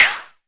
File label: mbrute sn, Arturia MiniBrute